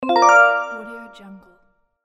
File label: correct